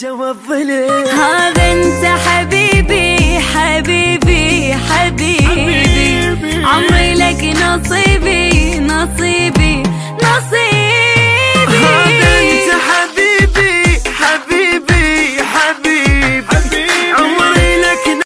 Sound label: Arabic Song Ringtone